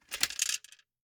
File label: Gumball machine, metal, glass, candy, switch, mechanism, rotate, dropping, hyper; Eiravaein Works; Vaeyan IV